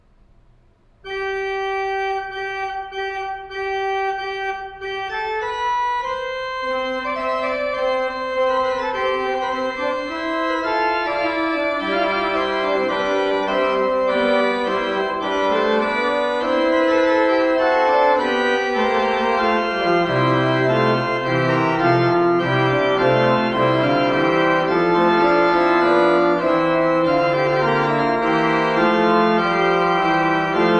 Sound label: Choral Prelude, Organ; ''Dies sind die heil'gen Gebot''; Johann Pachelbel; Complete Organ Works